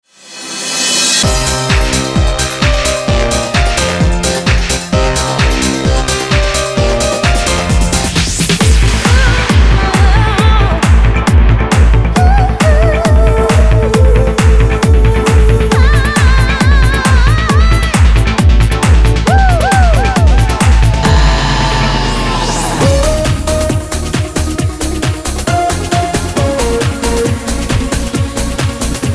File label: dance house; IMPstudio